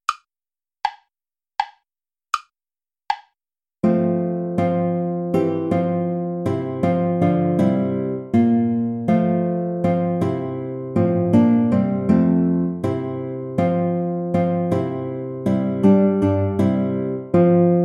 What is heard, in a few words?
play along without guitar; herr jesu christ dich uns wend